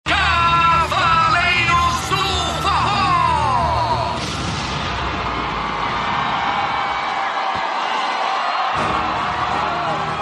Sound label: Forro ao vivo; FORRO E PISEIRO